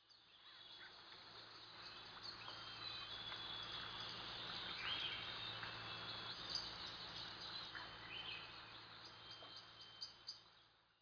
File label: birds